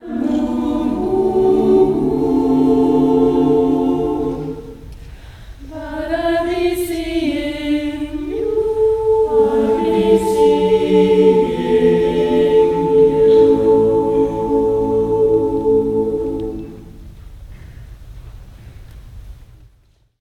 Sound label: church choral; best service Blue Box